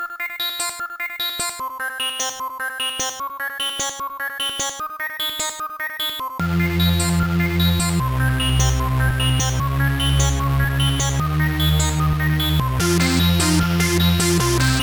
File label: mod techno beat box, Trackers; Modland